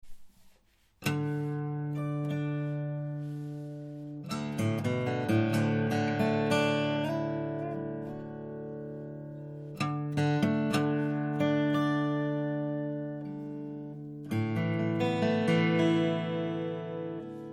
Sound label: drop d